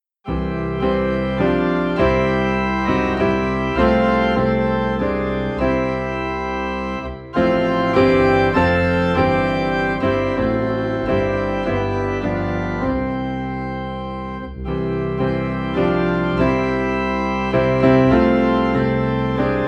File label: Piano and Organ Low in C; Give Me Thy Heart